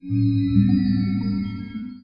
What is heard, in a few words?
Enchant; Slayer Enchant L; Net Power 2001 February; DarkEdenBetaTest